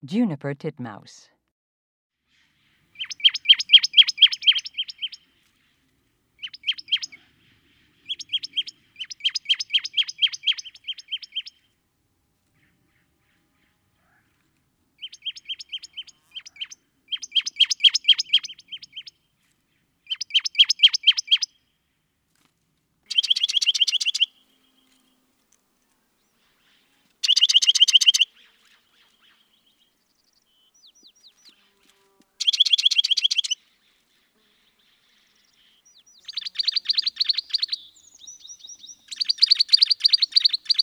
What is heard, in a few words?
Juniper Titmouse